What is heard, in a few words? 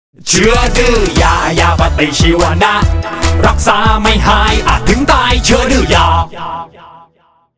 jingle; Chua Due Ya